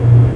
fan; Dino Crisis; room

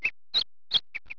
bird; Deers Revenge

